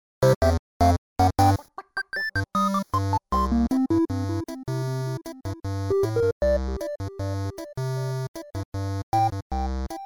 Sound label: Chirptune Menu; Scoop'n Birds